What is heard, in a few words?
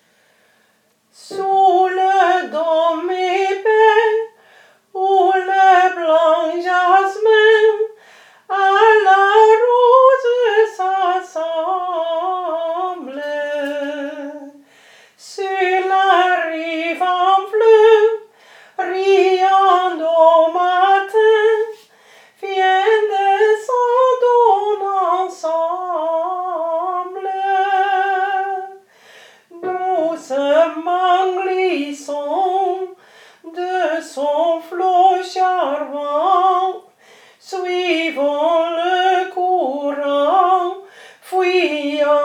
Flowerduet alt, vrouwenkoor